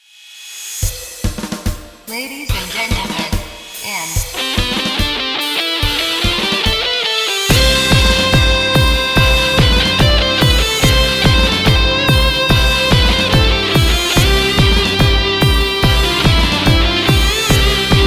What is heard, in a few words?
Bolero